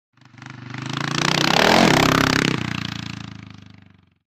Motorcycle; In / Over Ramp; Trials Bike Up And Over Ramp. Rev As Bike Hits Ramp.